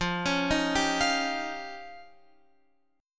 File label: F 7th Suspended 2nd Sharp 5th, Guitar, Open F; F7sus2#5; arpeggiated; JGuitar